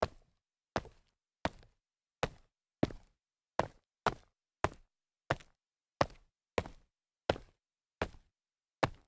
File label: sneaker small jumps concrete, foley